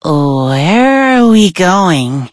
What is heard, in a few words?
loading